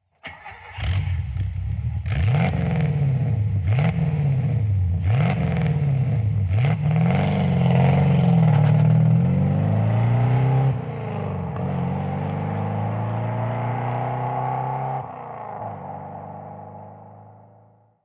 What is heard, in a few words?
300 SEL 6 3, Mercedes-Benz